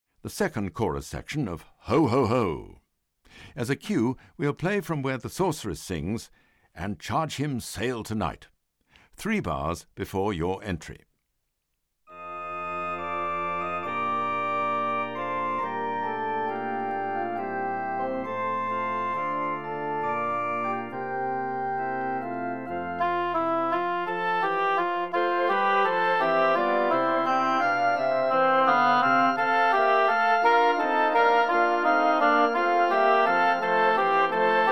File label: Second chorus of 'Ho-ho-ho', Alt; Dido; Choraline